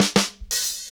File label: Fills; AMG; Now CD-ROM (Roland)